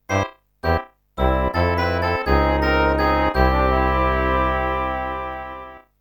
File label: jingle ~ game over